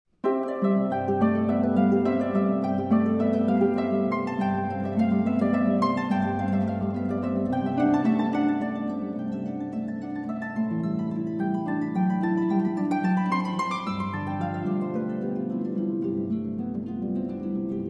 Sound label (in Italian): Toccata per Arpa; Sarabanda e Toccata per Arpa II.